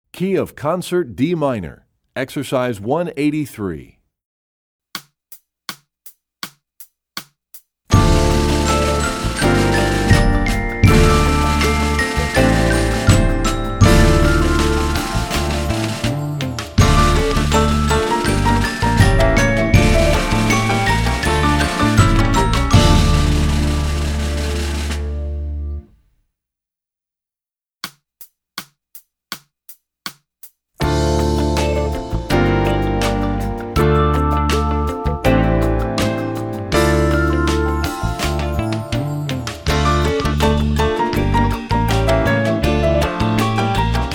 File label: Studies Concert D Minor, Percussion; Rubank; EE2000 Book2